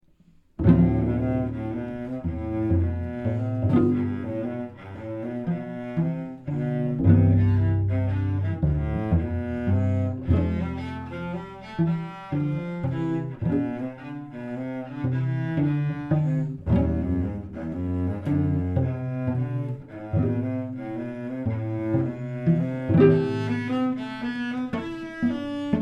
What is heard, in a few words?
Etude for 2 Cellos